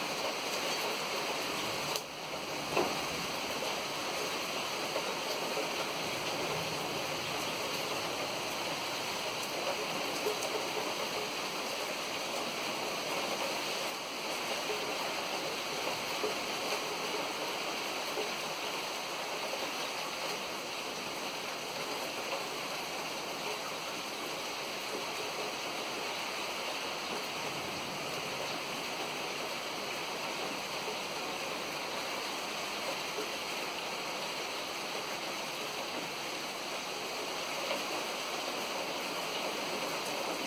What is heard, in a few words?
amb rain loop